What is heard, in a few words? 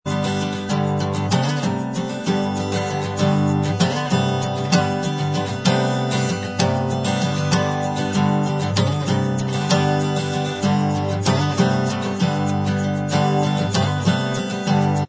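acoustic, instrumental, backing track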